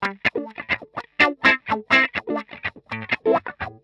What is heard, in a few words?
125 wah A, wahwah; PowerFX - Nu Jazz House